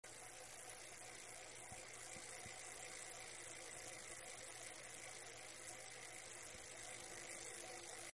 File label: bike air; free rider hd